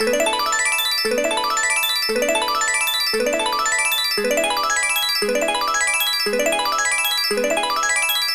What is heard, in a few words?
Win Count Up Loop; netent